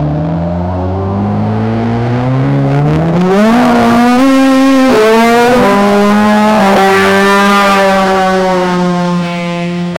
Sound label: formula one bolide crash